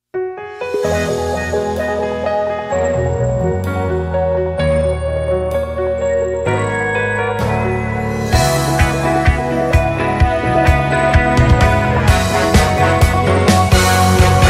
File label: Piano